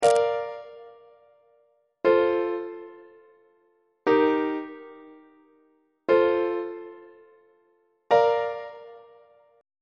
a sharp major perfect authentic cadence on treble clef